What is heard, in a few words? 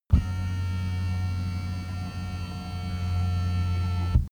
car seat massage engine hum